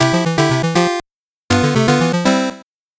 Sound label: 8bit jingle normal